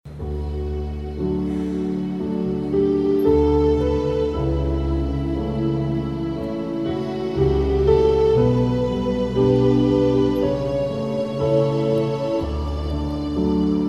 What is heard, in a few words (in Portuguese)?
Piano e Cordas; Sinfonia da cantata 156